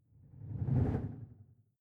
whoosh simple flutter phase low

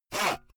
saw use short

